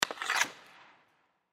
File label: Hockey Stick Slide Puck Shot; Blastwave